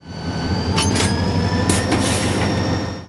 Uncouple; RailPro

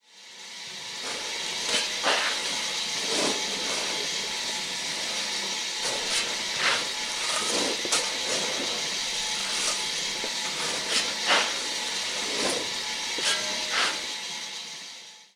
Shoveling Coal; RailPro

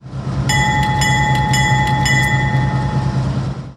Bell GE Steel; RailPro

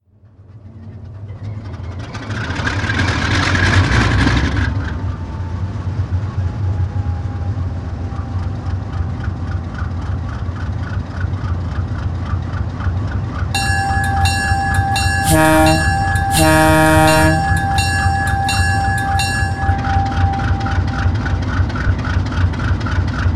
GE 7FDL-16 Early; RailPro

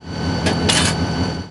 Uncouple Lever; RailPro

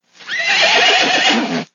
Horse Sounds; RailPro

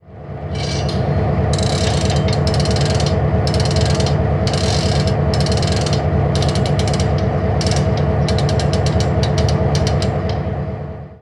Handbrake Set; RailPro

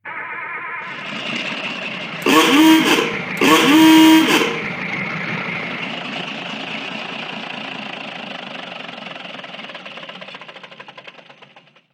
Horn Ahooga; RailPro

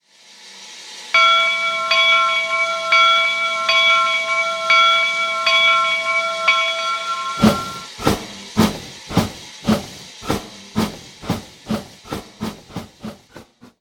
Bell Jupiter; RailPro

